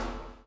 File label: CHAIN; Spectrasonics - Backbeat